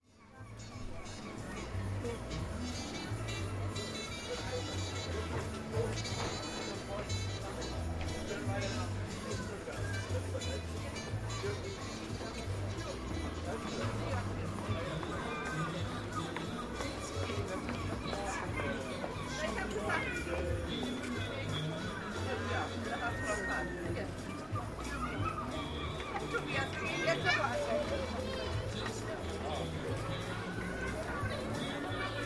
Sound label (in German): neuer markt weihnachtsmarkt